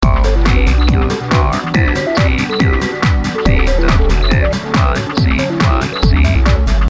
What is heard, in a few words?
loop